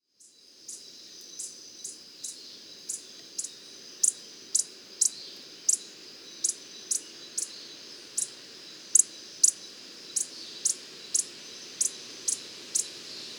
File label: Coppery headed emerald